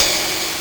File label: Drumul, Nintendo Gameboy-LSDJ; 300 Drum Machines